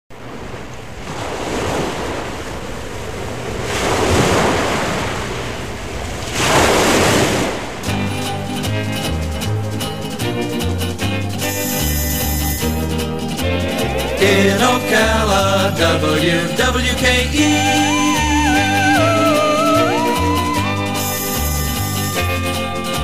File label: jingle; In Ocala WWKE surf